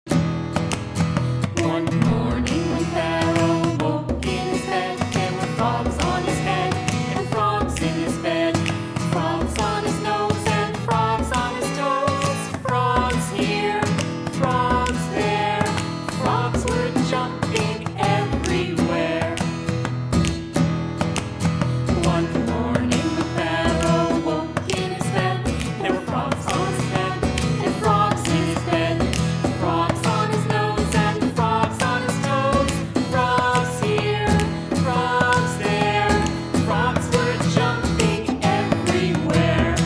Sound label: Frogs Song